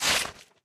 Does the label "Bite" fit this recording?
Yes